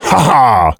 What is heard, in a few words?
Male Ha Ha; blastmorph pinball; xbox series xs